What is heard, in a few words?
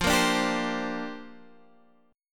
A sharp 6th Add 9th